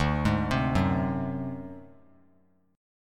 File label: D 7th Flat 5th D down, chord; D7b5 arpeggiated; JGuitar